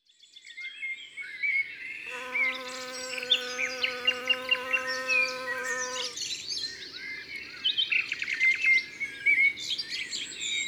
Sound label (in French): Bourdon matinal Saint Laurent les Bains; Ardeche; Ambiances Sonores d'Ardeche; Studio Les Trois Becs